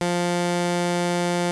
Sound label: Prophet 5 F4, Synthesizer; Hollywood Edge - Giorgio Moroder Rare Synthesizer Collection